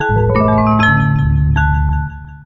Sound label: SUCCESS CHIME Bells Sparkle Tune Completion; GameBurp; 2000 Game SFX